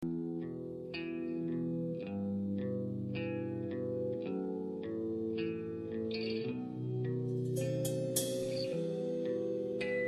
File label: Ukrainian song; modern